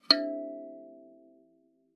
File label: KALIMBA E5; Xsample VOL-7